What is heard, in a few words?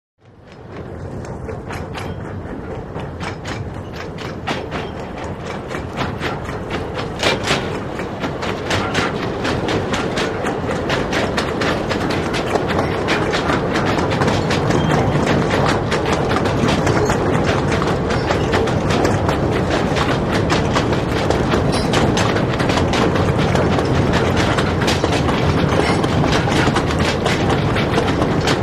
Away Diesel Train Pas